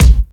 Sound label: sharp kickdrum a key, Loudest frequency: 589H; kick drum samples